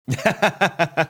chuckling

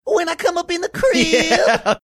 singing when i come up in crib

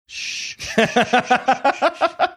shushing laughing

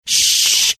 shhhh

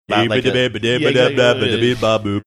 singing little bit doo wop

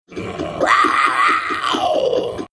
panther growl

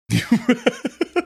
cute laugh

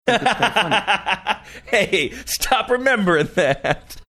laughing saying hey stop rememberin that

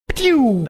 making bullet ricochet sound

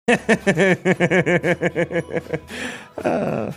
laughing and a sigh

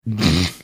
snorting

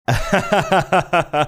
chuckling

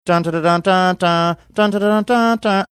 singing election coverage jingle; npr

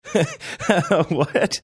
laughing saying what

